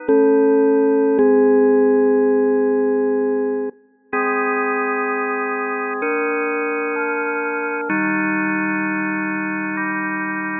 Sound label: odd organ